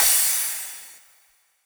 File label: 128BPM Spring Brake Kit, Future Big Room; Shockwave(PIL) - Future Big Room vol 1